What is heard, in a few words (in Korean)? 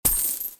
돈주머니 효과음; Streamingle